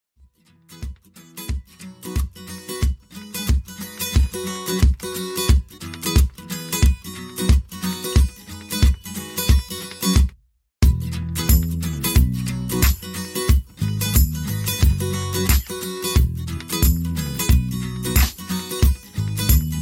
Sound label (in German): Freiheit Instrumental